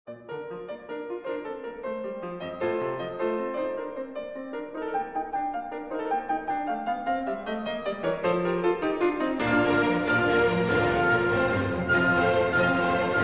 Piano Concerto in B-Flat Major, Rondo - Molto allegro; Piano Concerto No. 2; Op. 19